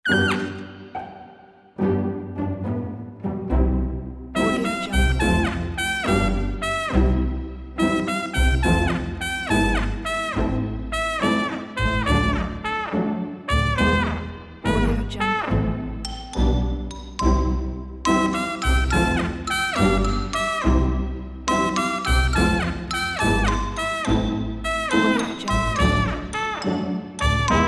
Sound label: funny kids